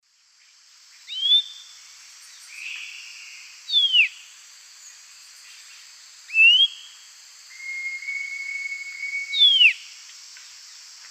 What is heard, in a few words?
Euphonia laniirostris, song; S. America; Eastern Lowlands